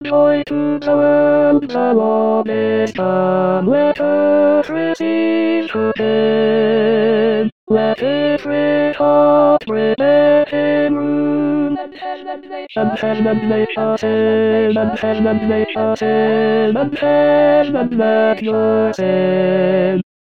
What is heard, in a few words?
TENOR; Joy To The World